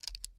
Glasses; MiSide

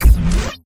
Scanner Finish; Game rip; MiSide

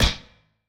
Fight Hit; Game rip; MiSide

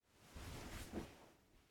Movement; MiSide

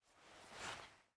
Take Head; Game rip; MiSide

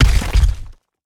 Boss Hit; Game rip; MiSide

